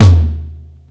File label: Floor Tom, Tom One-Shots; KJ Sawka - Drum n Bass & Beyond; Drum Tool Box